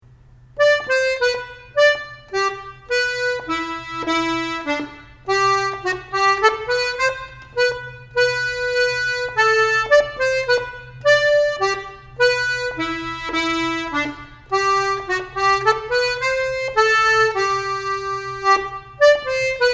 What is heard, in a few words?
slow Curly Headed Ploughboy